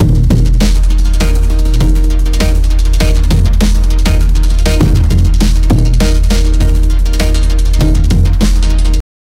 loop of an artcore drum

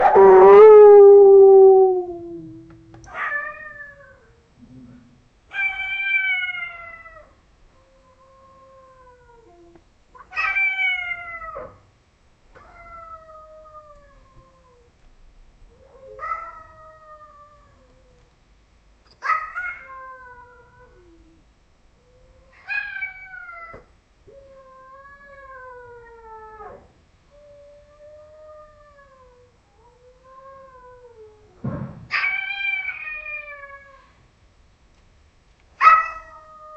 cats sound war with dogs

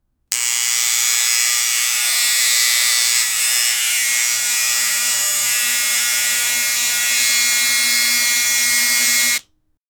an electric shaver that s, tool

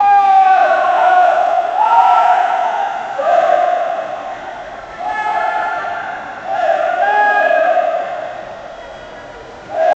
cricket crowd shouting ne

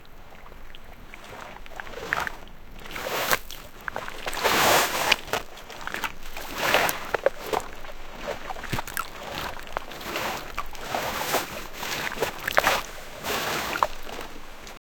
a man in a fishermans, water splashing

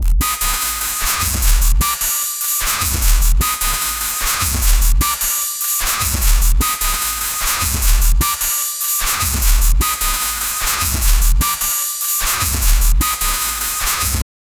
a weird percussive chirpy, bass

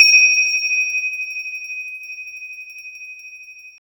generate a single soft in, temple

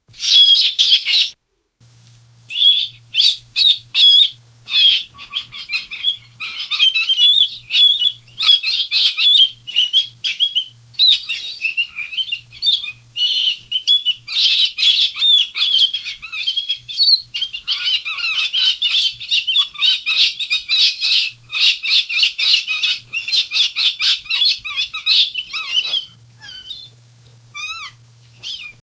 loud high pitched squawks, tropical

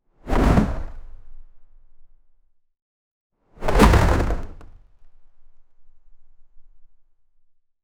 fast wooden object throw; physical

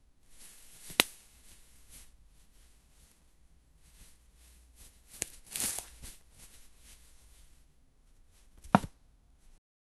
picking cotton, farming

